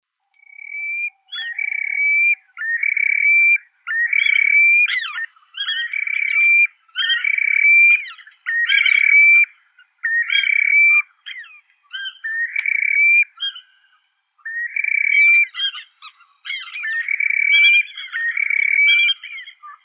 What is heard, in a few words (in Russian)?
morodunka xenus cinereus